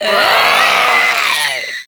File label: Infested Runner Attack; Warframe; Runners